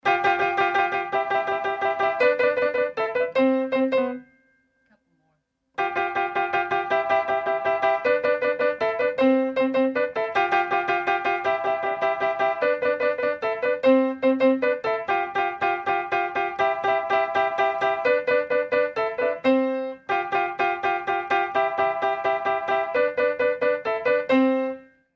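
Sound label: Piano Play Chopsticks; pianos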